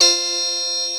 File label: 5TH LEAD C4, Analog; USB Soundscan vol.49; Analog Fantasies; AKAI; PROPH